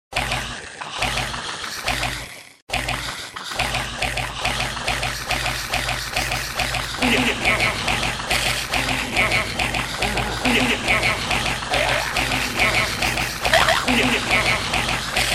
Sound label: eech; cave